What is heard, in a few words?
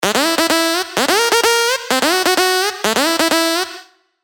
dirty dutch leads; Spire; Klub Joker; revealed spire dirty dutch leads vol 1